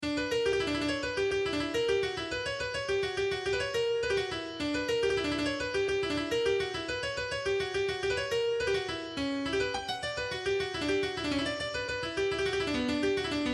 MIDI; friday night funkin vs. umbal family computer

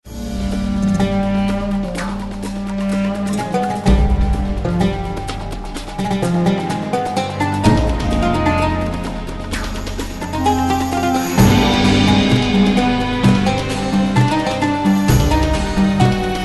BGM Menu

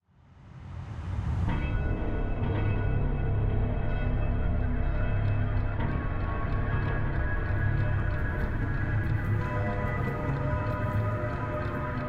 Falling; Cave Music

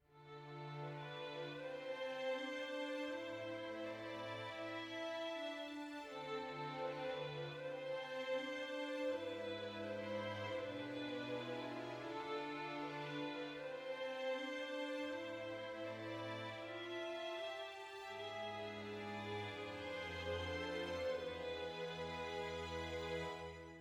sad soft; Quivr; PS4 Windows gamerip 2016

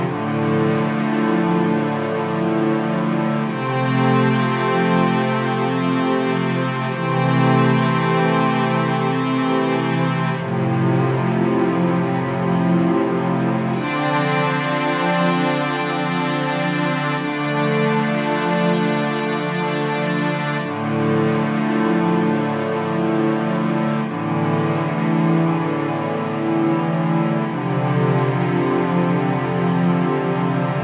Violon Long; ps vita; Didot

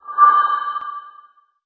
Music Feedback Corps

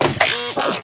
Powerup

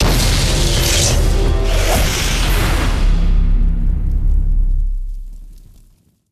Splash; Garshasp The Monster Slayer

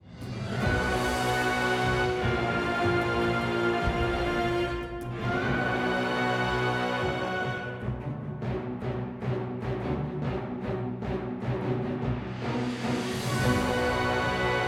Battle Theme; Shadow-Cursed Lands; Sudden Combat Bridge

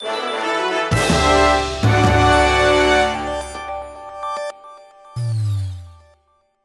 Jingle Congratulations; Mario vs. Donkey Kong

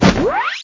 Cat launch - Boost speed Sfx; My Talking Tom; Android

